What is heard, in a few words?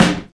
original steel snare drum f sharp key